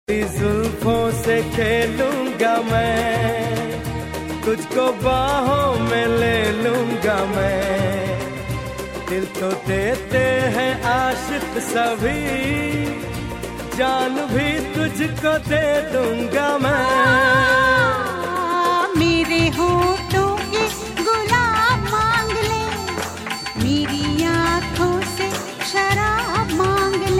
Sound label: Duet